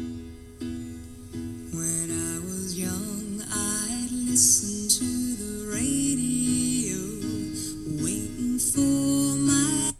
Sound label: Song